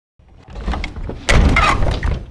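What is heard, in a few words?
big wood close, door; Mafie 1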